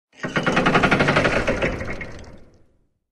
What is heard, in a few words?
gears spinning